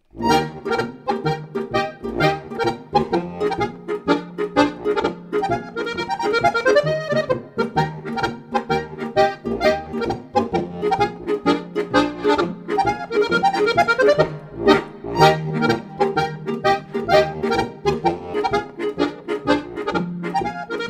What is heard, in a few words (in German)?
polka